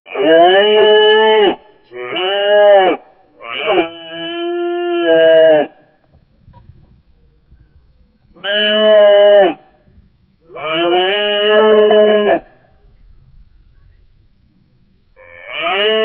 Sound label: the sounds of a fight, conflict